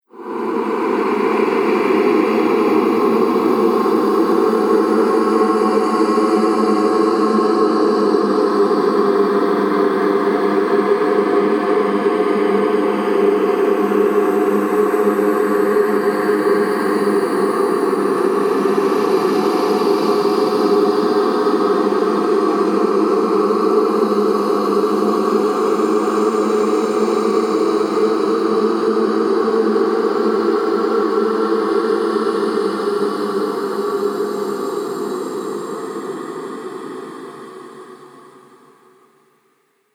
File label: Haunted Voices Drone; Ghost Sound Effects; Horror Sound Effect